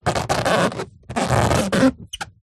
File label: foley balloon large inflated handling squeak